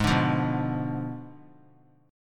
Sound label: Ab Diminished 7th Ab, 3C, 3F, 3A, 3D; Abdim7 strummed; JGuitar